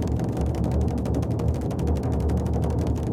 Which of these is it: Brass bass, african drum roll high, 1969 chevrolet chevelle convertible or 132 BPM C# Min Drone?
african drum roll high